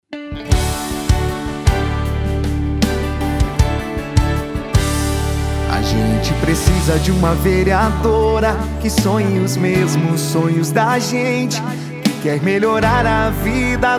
Jingle VEREADORA; De volta a esperança; rechim; RENOVACAO

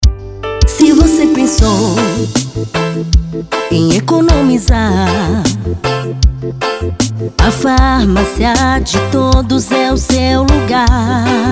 FARMÁCIA REGGAE; FARMÁCIA DE TODOS

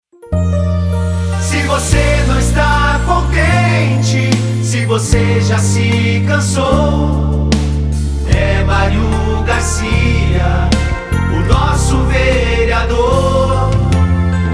Jingle VEREADOR; Camaqua; RENOVACAO

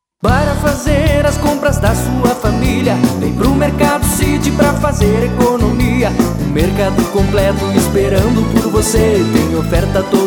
Jingle Mercado

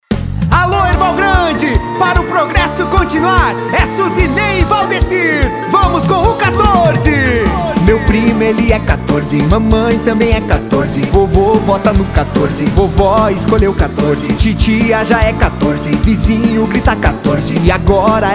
Jingle PREFEITO; Erval Grande

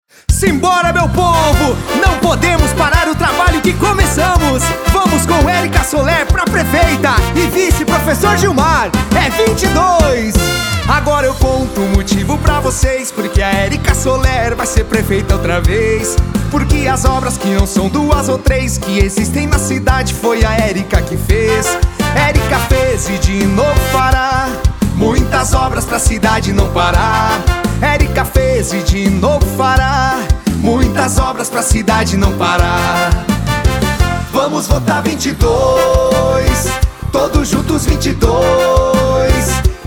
Jingle PREFEITA; Fez e denovo fará; Erica Soler

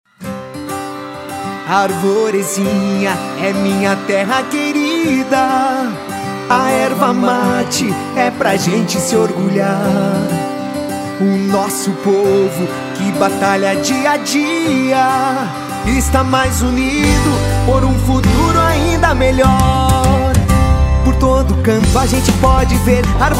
Jingle; 11 Jaime

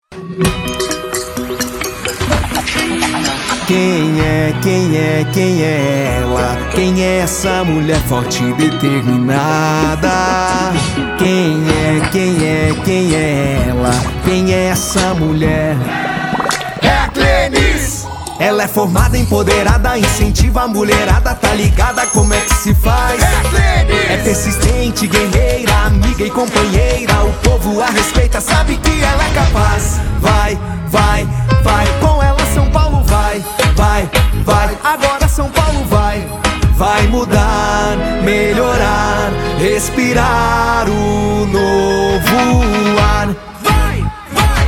Jingle DEPUTADA ESTADUAL; Mulher Forte e Determinada; Clenys; Sao Paulo; RENOVACAO

